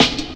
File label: Beatle Cut, Jungle Drum Loops; Zero-G Jungle Warfare 1, 2 & 3